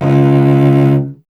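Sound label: strings cello C#; Miroslav Vitous; Solo Instruments VOL-1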